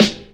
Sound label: Snare, Percussion Hits, Snares